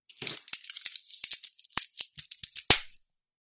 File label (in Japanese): 時計をつける